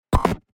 UI invalid sound

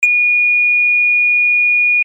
beep

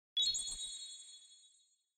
UI comform sound

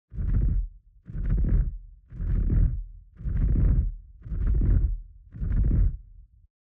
flying creature

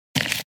UI dumping sound

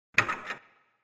unlock

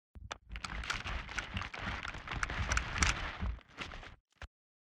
movement walk; Ranger

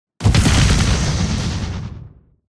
Fire element spell

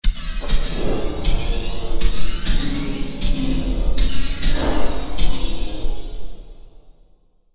Horror

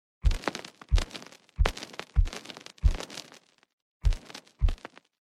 gravel road

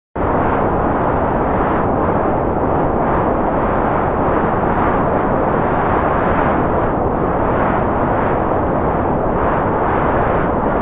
heavy wind

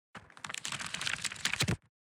thumb through the pages

